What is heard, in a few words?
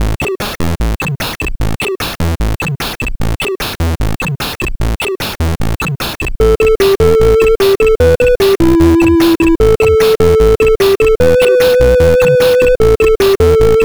c64 in game; human race